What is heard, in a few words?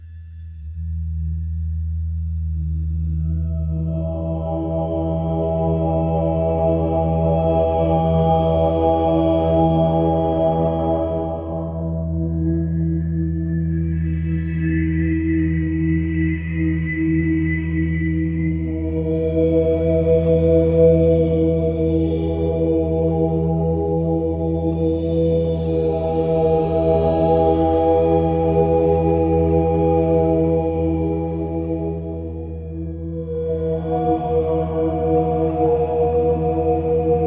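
create a vocal track chant, meditation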